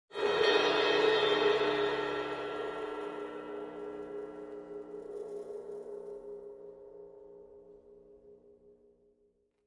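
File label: Cymbal Large Trill Timpani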